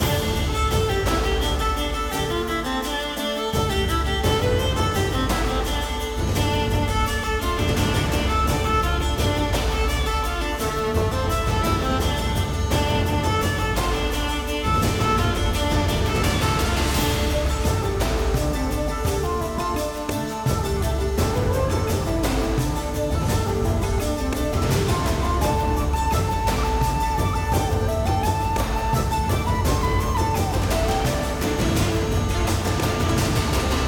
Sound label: Win Loop; crush your enemies